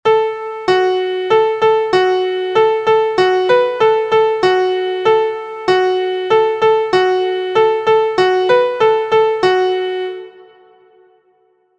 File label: MIDI